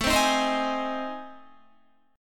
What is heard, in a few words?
A Minor Major 7th Flat 5th, Guitar; AmM7b5 strummed; JGuitar